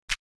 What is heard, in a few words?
UI Mouse